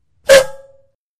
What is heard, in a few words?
Air Valve Oxygen